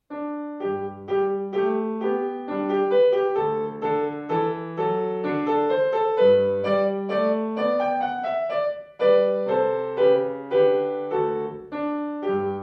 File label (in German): Menuett G-Dur, klavier; Haydn